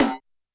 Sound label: Tom Industrial, YAMAHA RM1X